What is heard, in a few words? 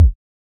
Kick; Samplepack Produtique